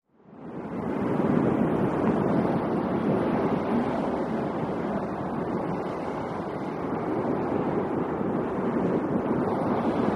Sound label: Heavy Wind Steady With High-pitched Whistling